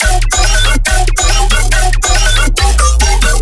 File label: bass loop brutal, 140, D; Virtual Riot